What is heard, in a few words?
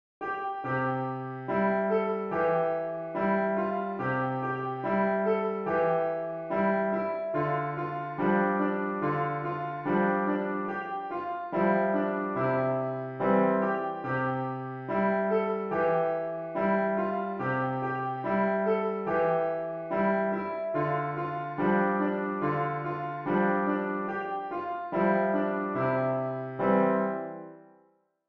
piano